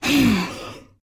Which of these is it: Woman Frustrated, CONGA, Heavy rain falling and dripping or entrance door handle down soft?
Woman Frustrated